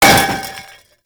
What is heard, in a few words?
Cannister Bounce; Enemies; Abilities; Warframe; Tau; Dax Archer